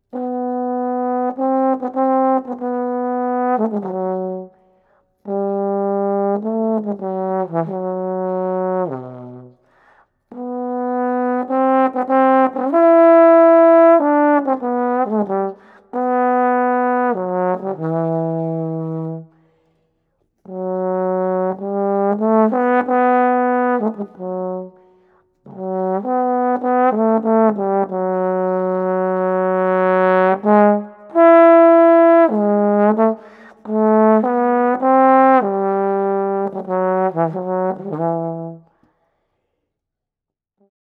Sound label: Trombone Solo